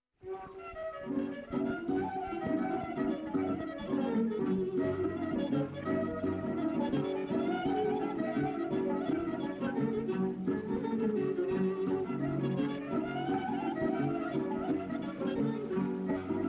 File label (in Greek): Σηλυβριανό Συρτό; 1928; Odeon (GER.)